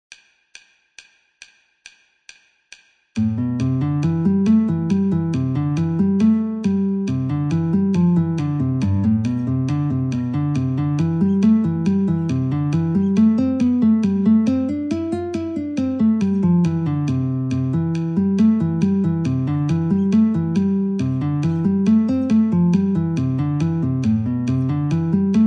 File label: Guitar in C metronome 69